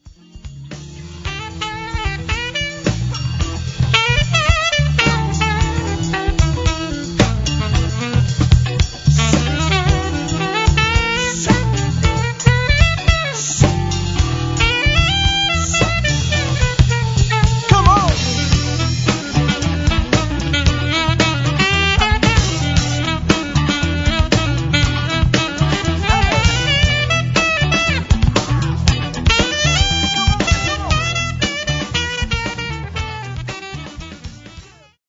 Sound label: Funky Music